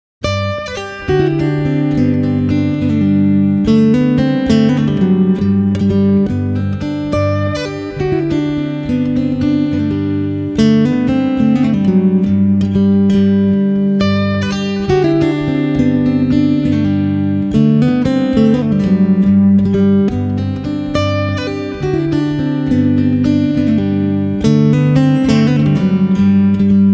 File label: compressor; pc 2a